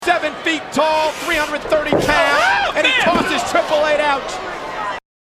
triple eight ouch

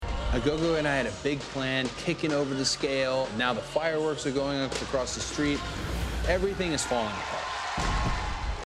kicking over the scale

